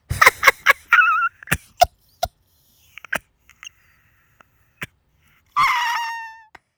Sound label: LAUGH, HipHop Vox